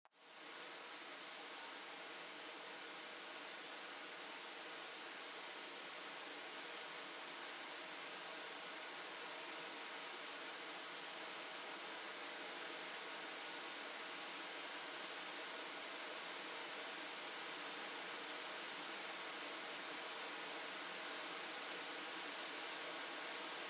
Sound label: waterfall gate; RPG Maker; Grimm's Hollow